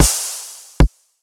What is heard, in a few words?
single beat